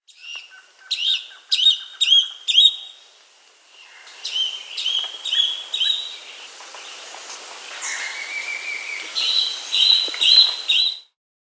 Yellow-margined Flycatcher (Tolmomyias assimilis), Bolivia; S. America